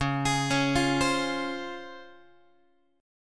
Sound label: Db Major 7th Db down Guitar; x,9,11,11,9,11; DbM7 arpeggiated; JGuitar